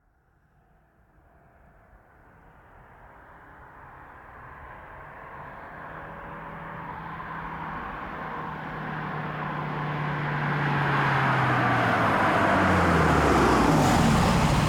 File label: Volkswagen Golf V 1.6 FSI exterior stop reverse and drive away; Soundholder; mono NTG3